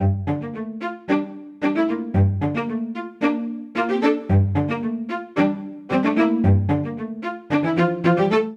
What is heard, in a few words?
Phrase Yellow Taffeta G Dorian 112 bpm, String Quartet; MIDI Clips; Ableton; Factory Packs; Spitfire Audio